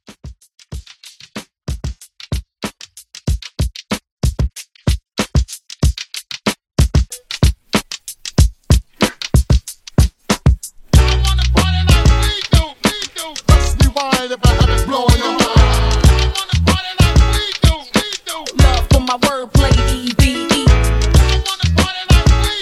90s Old School Hype, 94

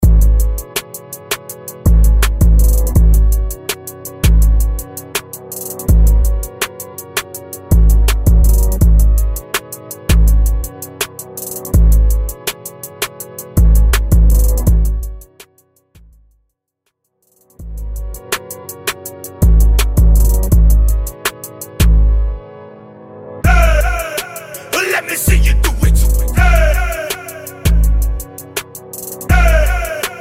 Hip Hop Rap Mainstream Hype Into Extended, 82 Bpm; Vegas Edit

